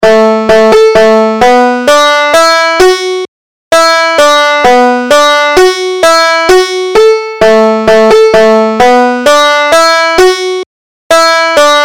banjo

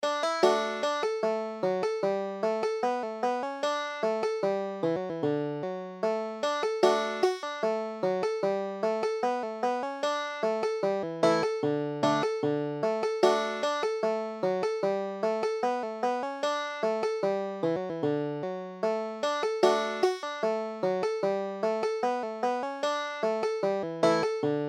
banjo

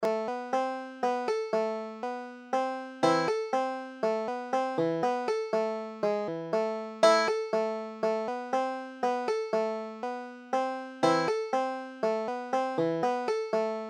banjo

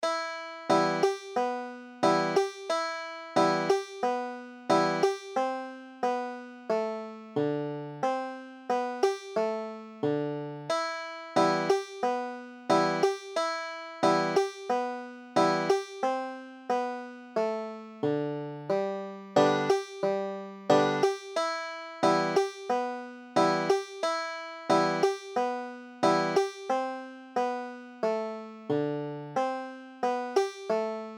banjo

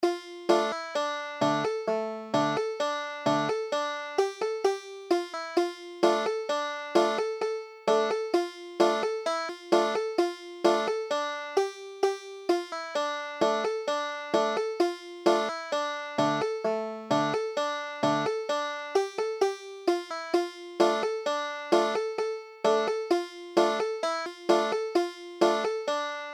belzer nigun, banjo

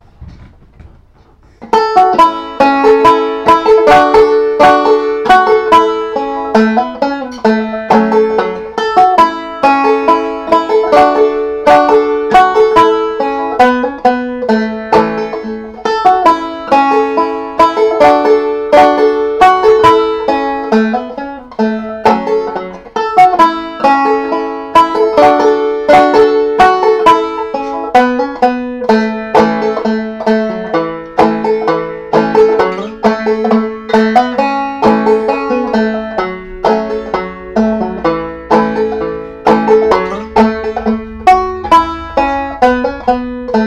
booth shot lincoln, banjo

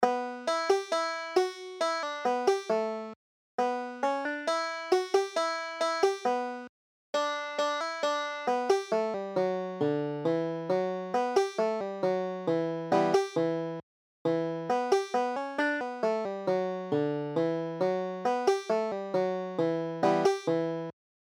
boyne water, banjo

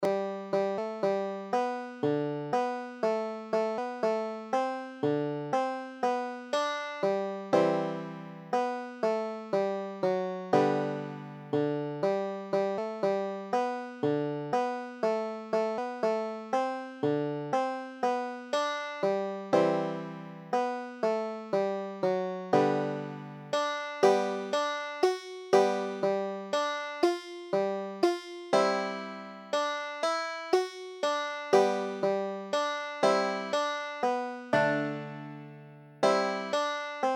lilliburlero, banjo

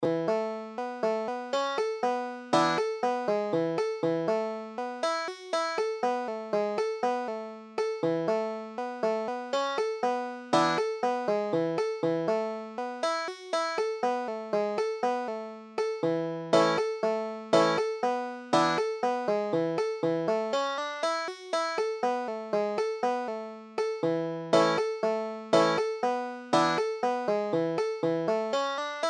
banjo

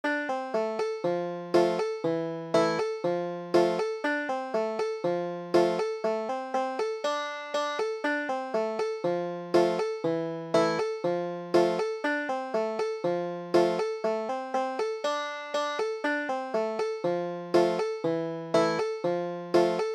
julia delaney, banjo

